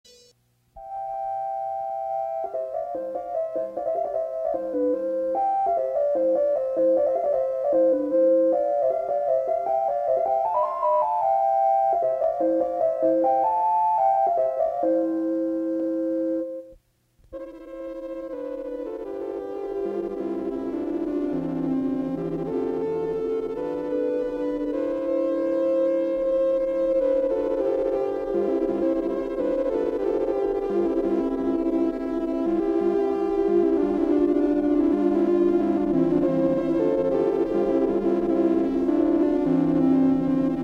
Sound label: Xmas Songs on Buchla